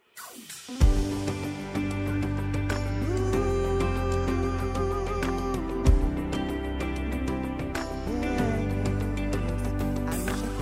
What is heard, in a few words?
Tenor w Lead; Tenor Parts; christmas choir